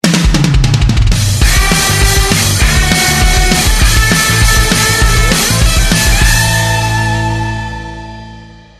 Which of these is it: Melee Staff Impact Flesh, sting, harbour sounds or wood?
sting